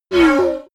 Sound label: sci-fi laser shot short